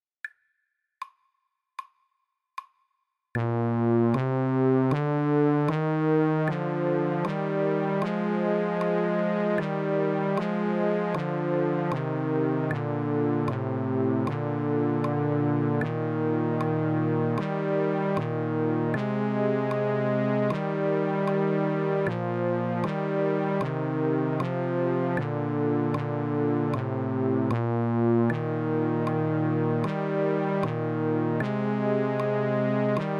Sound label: marching tune